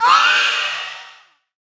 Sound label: Shylph Die